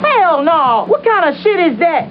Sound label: exclamation; Desktop Studio 2000